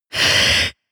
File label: inhale female